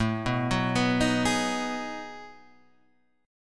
B 13th A, chord

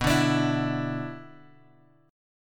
B-Minor Double Flat 5th; Bmbb5 Chord {X 2 0 X 3 0} strummed

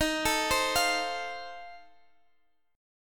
F Major, D sharp; F/D#; arpeggiated

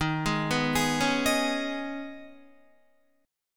D sharp 7th Suspended 4th Sharp 5th, Guitar; D#7sus4#5 arpeggiated; JGuitar

